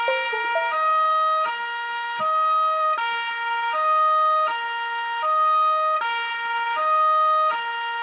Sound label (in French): Pompier; JQ6500